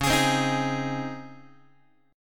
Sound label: C sharp Major 7th Suspended 2nd, Guitar; C#M7sus2 strummed; x,9,11,10,7,7; JGuitar